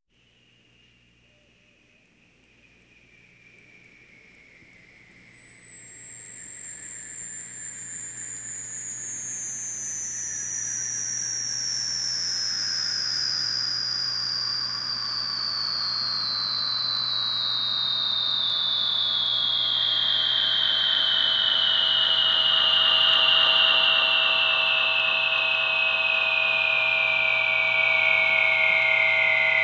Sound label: Ufo, science fiction effects; KMP Film Video Sound Effects